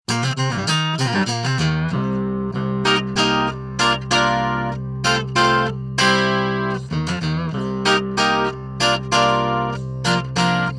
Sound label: Line6 JTV89 Stereo Micro Crush Clean